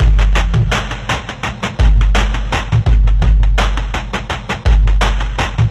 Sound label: deep claps, drum loops